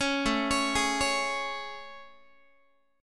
A Major 7th A, Guitar; AM7 arpeggiated

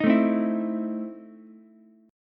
E Major 7th Suspended 4th Sharp 5th Ukulele; EM7sus4#5 strummed

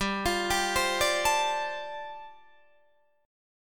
G 9th Suspended 4th, Guitar Open D

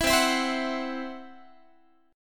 C Major Flat 5th Guitar; CMb5 strummed

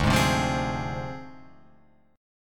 D Minor 7th Flat 5th Guitar Open F; Dm7b5; strummed

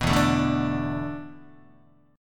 E 7th Suspended 4th, Guitar; E7sus4; strummed; 2,0,0,0,1,1

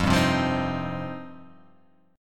F 7th Suspended 2nd

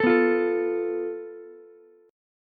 A Suspended 2nd Sharp 5th, Ukulele; Asus2#5; strummed; 14,11,13,12; JGuitar

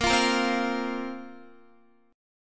Bb Suspended 2nd Bb Guitar; Bbsus2 strummed

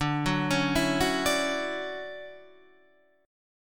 D-Major 9th D down Guitar DADGAD

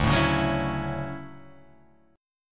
D-Major 9th D down

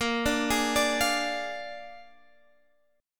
Bb 7th Bb down Guitar Open F; Bb7 arpeggiated

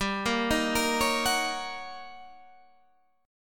Eb 7th Sharp 9th, G

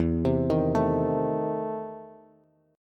E Major 7th Suspended 4th Sharp 5th E down Bass; arpeggiated; JGuitar

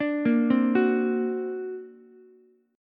D Augmented 7th Ukulele, chord; D+7 arpeggiated; JGuitar

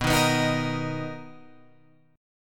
B Major 7th B down Guitar Open F; strummed

